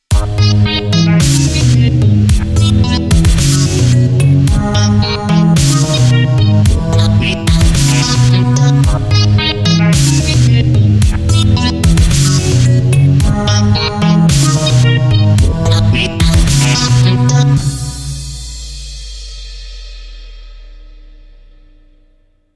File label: rainbow theme